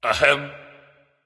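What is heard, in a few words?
nonverbal clear throat; smite; voicepack; ullr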